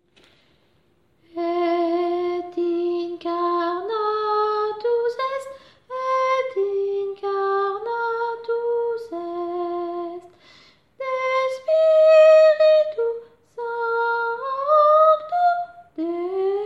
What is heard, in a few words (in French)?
voix; Et incarnatus est